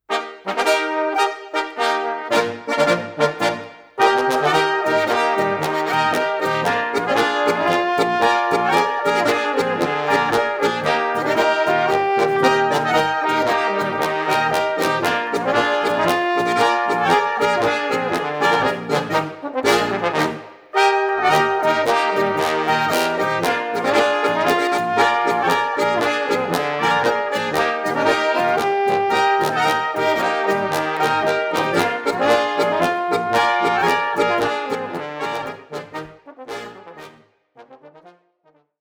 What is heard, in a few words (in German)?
Marsch